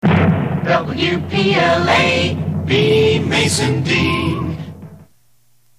jingle; WPLA

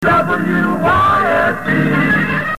jingle; WYSE

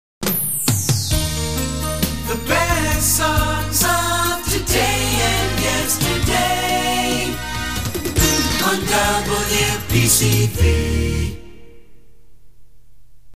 jingle The best songs; WPCV

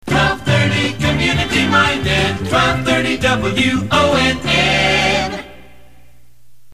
jingle Community Minded; WONN; 1230

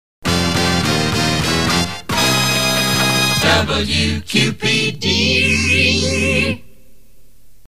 jingle short; WQPD

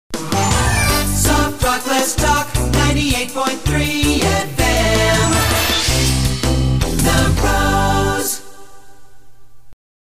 jingle; WWRZ

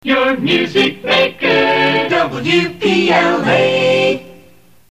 jingle your music maker; WPLA

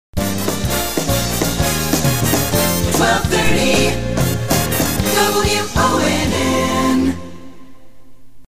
jingle; WONN; 1230 WONN

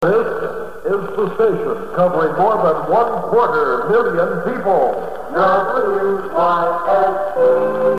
with jingle; WYSE

